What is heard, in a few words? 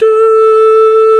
VOX, Male Scats